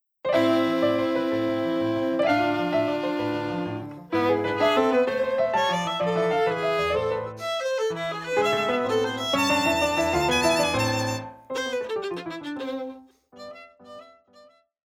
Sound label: Beethoven Violin Sonata; op12 no4 movt1